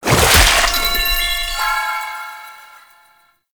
Paint Deposit; Gameplay; Warframe; Shadowgrapher

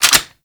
Infested Swarm Rifle Reload; Shock Swarm Rifle; Weapons

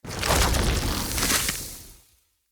Claw Reload; Enemy; Combat; Characters; Corrupted Sproutfolk

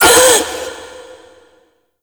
Minigame Ring Fail; Birth Minigame; Warframe; Jade Shadows

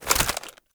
Reload, Weapons; Warframe; Braton Remaster

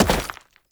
Step Rock; Warframe; Oraxia; Foley

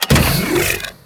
Gas City Extending Bridge Start; Warframe; Corpus Gas City; Gameplay Remaster; Ambience

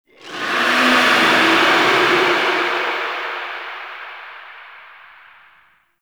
Attack Verb; Enemies; Warframe; Tau; Sentient Dactolyst

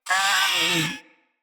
Feral Vocal Death; Catbrow

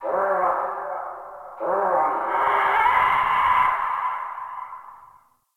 Distant Creatures; Warframe; Venus Open World; Venus Landscape; Ambience

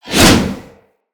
Hammer Whoosh; Weapons

